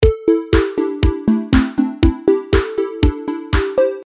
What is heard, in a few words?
delay